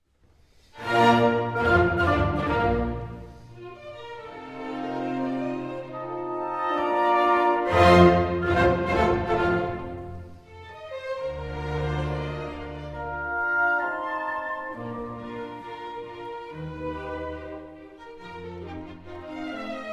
Piano Concerto in B Flat Major, Allegro con Brio

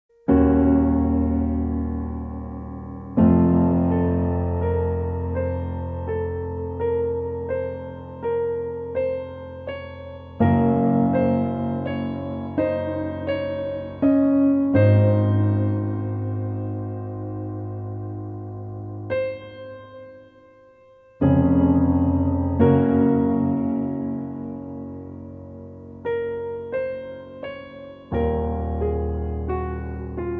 Adagio For Strings; From Platoon

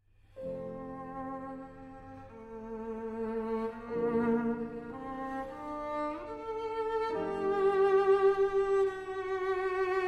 Pieces for Cello and Piano, Langsam; 1899 Version, Ed. Piatigorsky; Live at Schloss Elmau

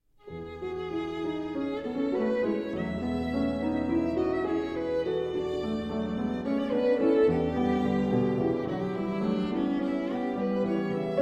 Sonata in E Minor for Violin and Piano; Op. 82

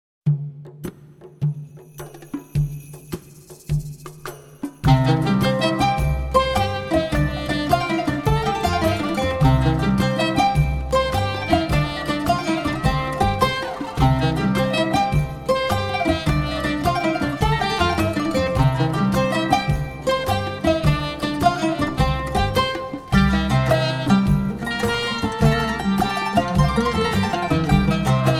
Iraqi Jazz

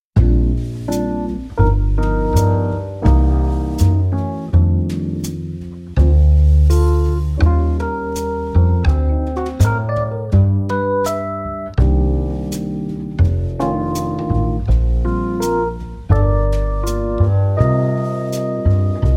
Calm Jazz Ambience

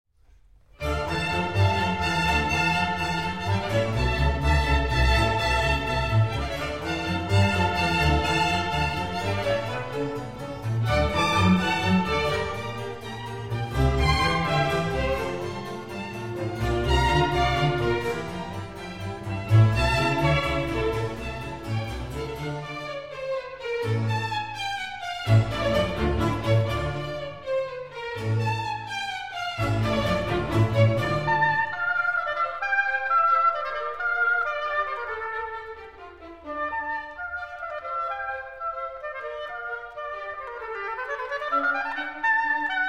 Oboe Concerto in D Minor Allegro

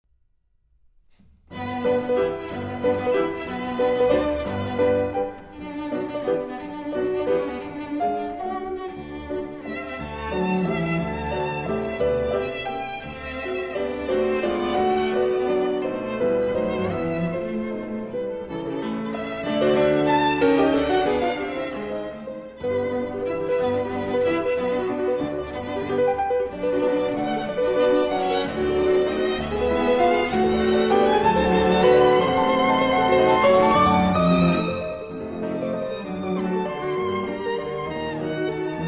Piano Trio in G Minor, Animé

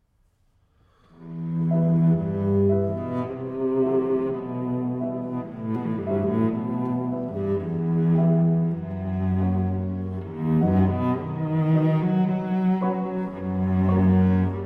Cello Sonata, Allegro non troppo; No. 1 in E Minor, Op. 38 I.

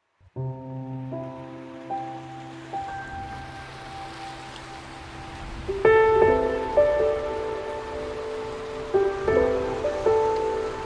Piano and Rain

